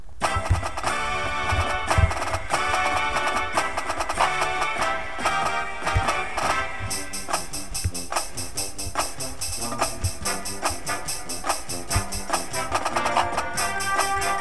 snare with music

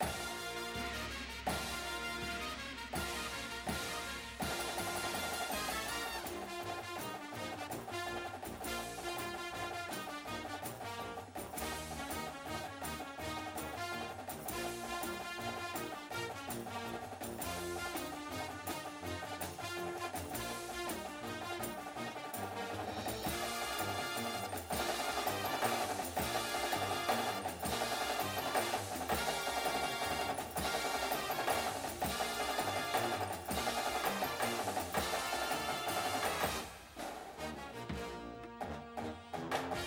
snare w music, drumline

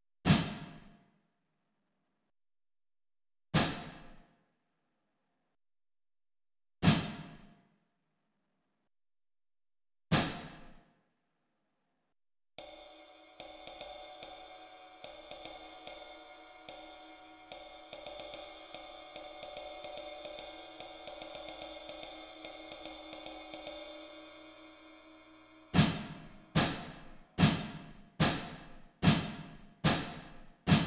cymbals, drumline

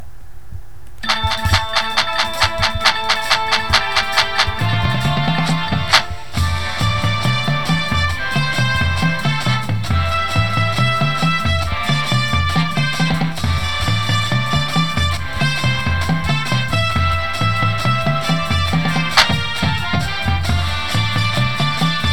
bass with music

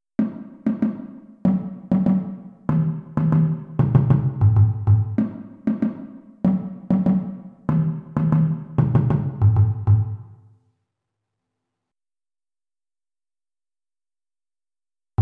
bass, drumline

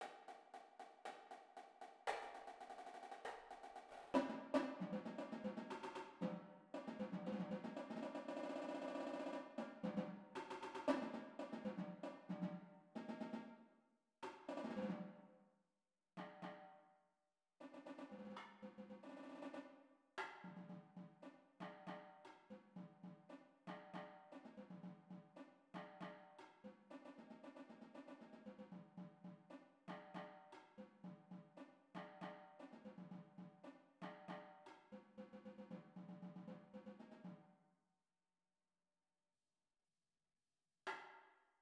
tenors, drumline

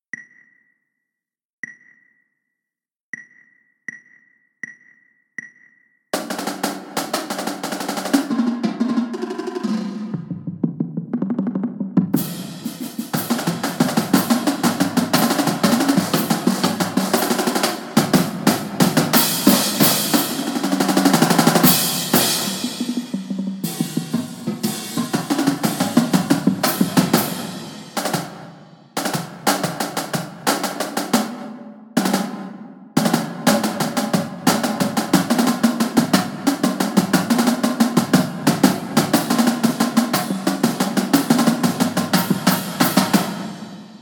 drumline

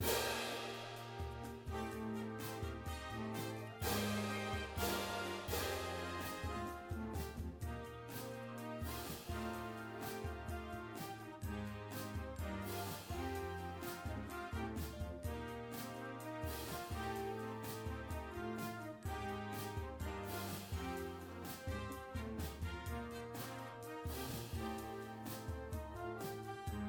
cymbals w music

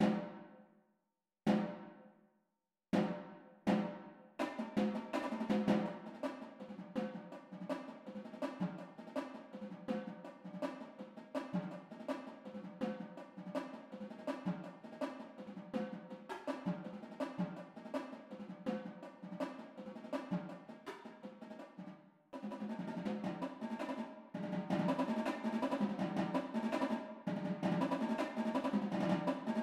tenors, drumline

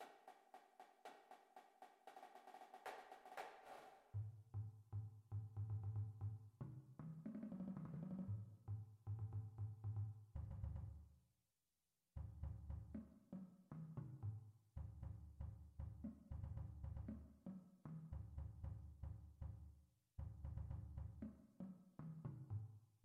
cadence bass

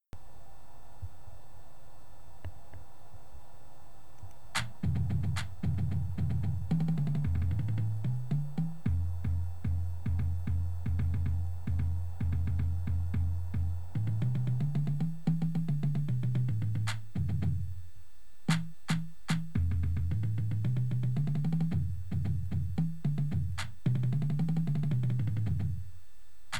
bass, drumline

